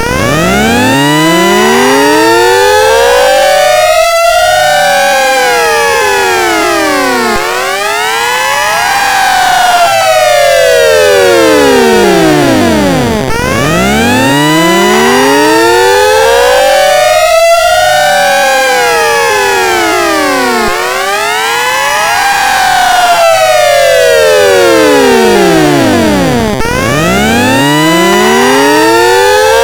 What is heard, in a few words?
alarm